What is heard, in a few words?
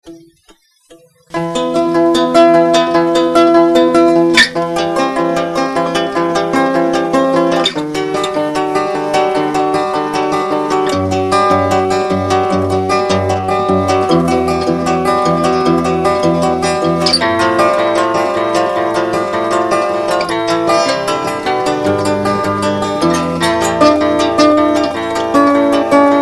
Arpeggio